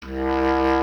B. Clarinet

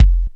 Kick Drum, Drum One Shots; Sonic Foundry (Sony Creative Software); Ambient Grooves; Kicks

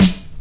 SD, Dance Mega Drums; Best Service

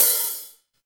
HH HH, HH Real

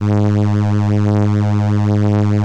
ELKA, String Machines; ELKA RHAP

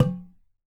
oxaca air, percussion; ILIO

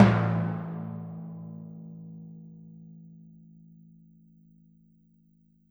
Timpani; Orchestral; Percussion One Shots; Sonic Foundry (Sony Creative Software); Orchestral Series VOL-4 - Rock and Pop

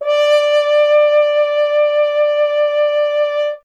F. Horn; Miroslav Vitous; Solo Instruments VOL-2

